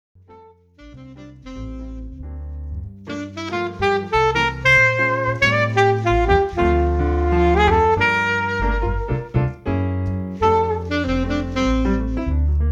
Keyboard, sax jazz

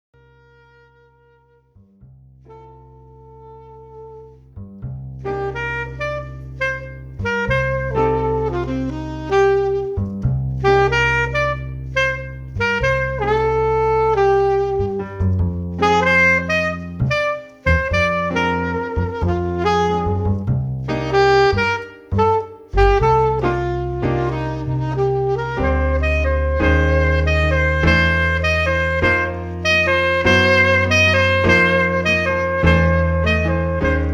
Keyboard and Sax; Keyboard and Sax Jazz; Lyrica Demo

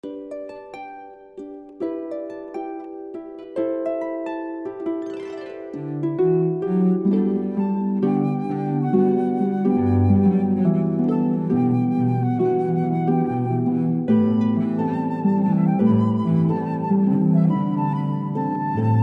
harp flute cello

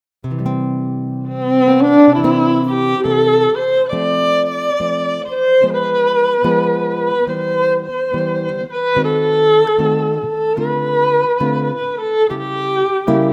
Duo of, guitar violin

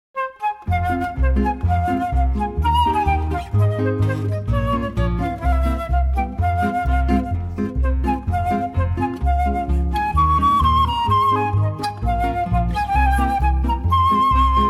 guitar flute bass trio

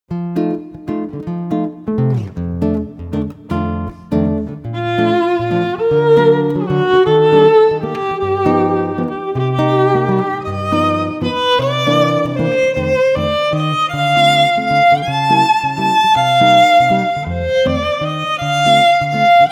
Duo, guitar violin

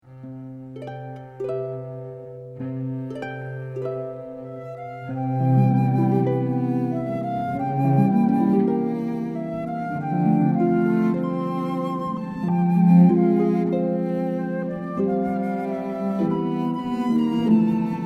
harp flute cello

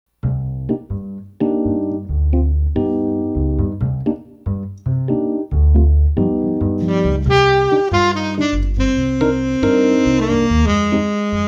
Keyboard and Sax Jazz

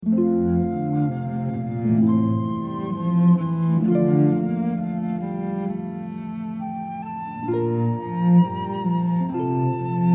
harp flute cello

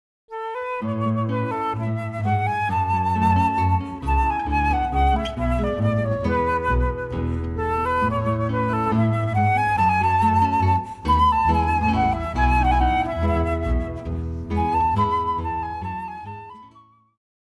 Gavotte, guitar flute bass trio; Aylesford Gavotte

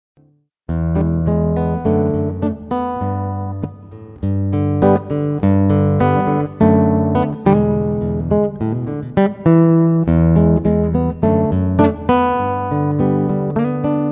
Guitar Solo

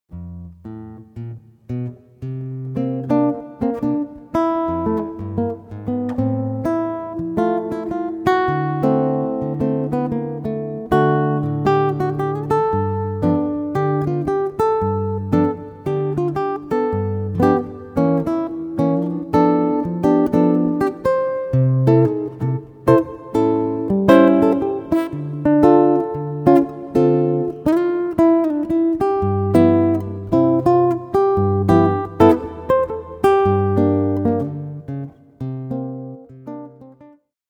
Guitar Solo

